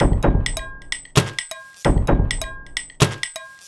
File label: Beat (130BPM), household rhythm